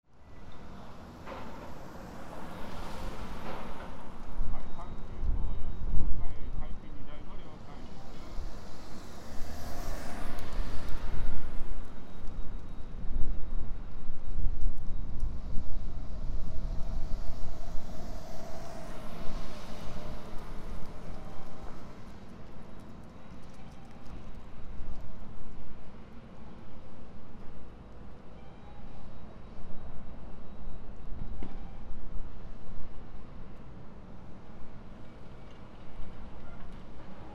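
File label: shinobu temporary site; shinobuyama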